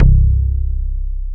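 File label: upright bass; SoundFactory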